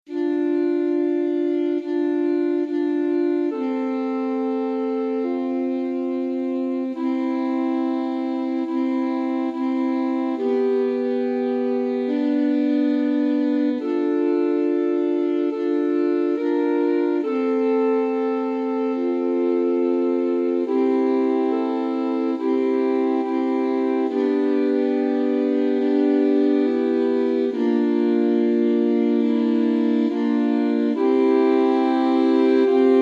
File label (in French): Trio de saxophones Débutant